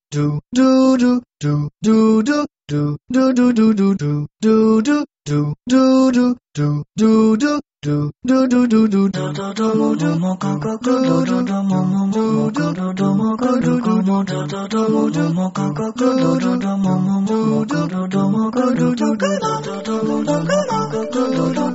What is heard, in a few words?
Docomo Ring Tone